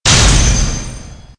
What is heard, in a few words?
die